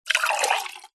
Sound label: drink water pour into tumbler drinking glass short fast